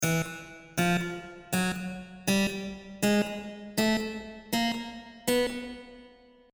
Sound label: stacc Body, harpsy